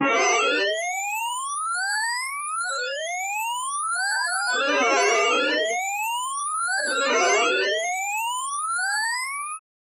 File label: arcade